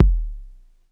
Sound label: BD Classic Lo, Bass Drum; Lost Tapes From Mars